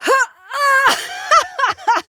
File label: Laugh; Warframe; Corpus Lich; Persona B; Lift